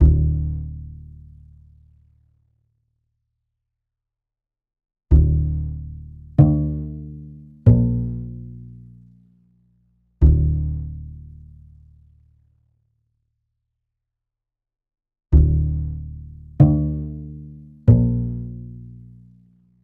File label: Upright Bass Dm 94, Lo-Fi; Loops; GhostHack; HipHop